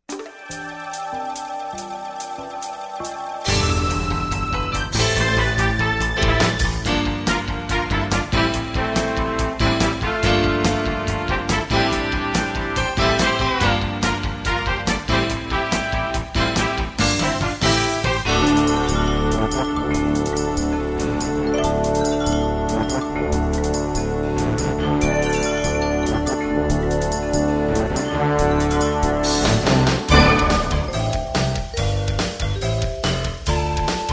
Birthday Bash Bed

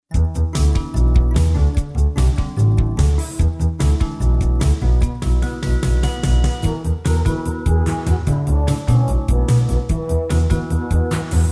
Bed

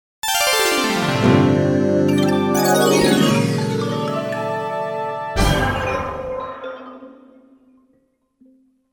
Half Hour Gong

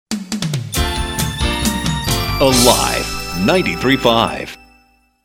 Weather Out

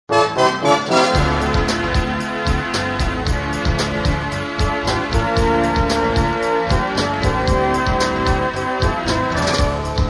Weather Bed

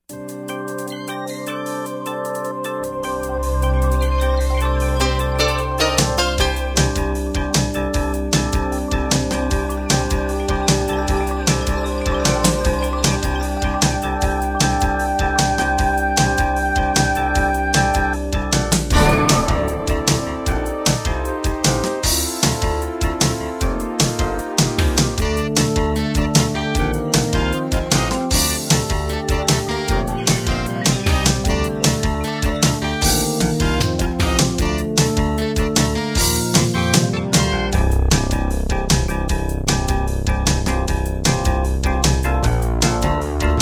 Music Mix Bed

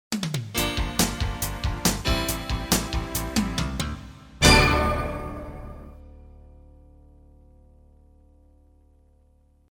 Spirited Music for Christian Living Bed

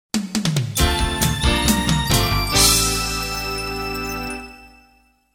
Pop Weather (out)

